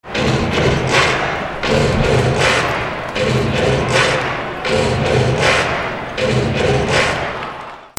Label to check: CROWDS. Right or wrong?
Right